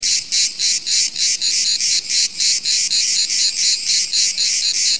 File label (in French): Cigales